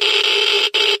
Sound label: geiger